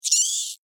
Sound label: Insect Voice Cry, Animal, Insect, Voice, Cry, Emotion, Vocalization, Bug; Blast Wave